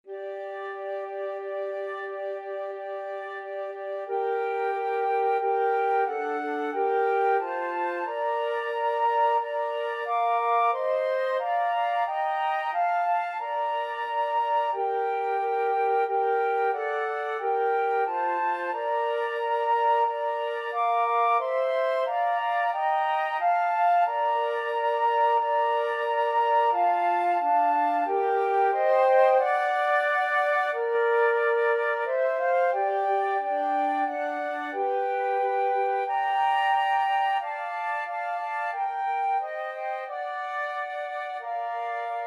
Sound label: pavane, flute trio